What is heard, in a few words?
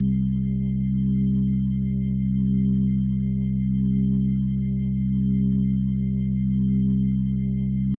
blues c2, Organ; TheB4